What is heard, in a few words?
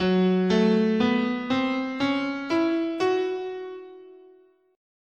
F sharp Blues, scale